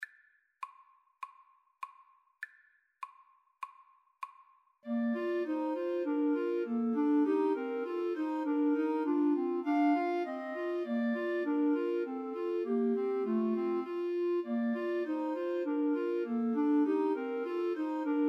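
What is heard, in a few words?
schumann melody 3 clarinet karaoke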